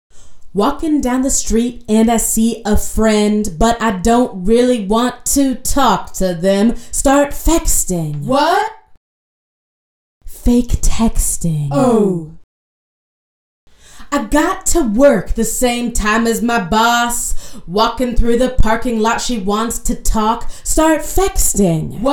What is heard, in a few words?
Vocal Stem; Fexting (Fake Texting)